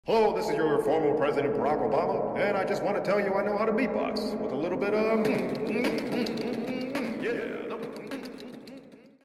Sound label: beatboxing